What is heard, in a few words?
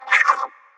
Cat meow; Super Mombo Quest